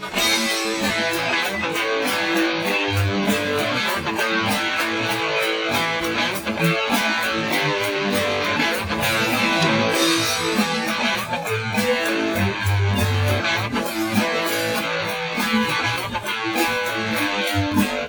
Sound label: guitar 2; sound source separation